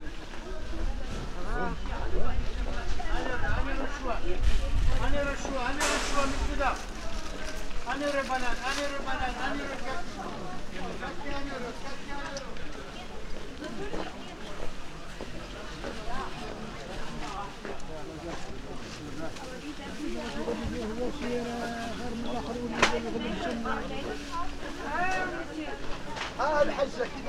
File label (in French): marche marne